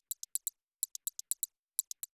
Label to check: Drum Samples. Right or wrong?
Wrong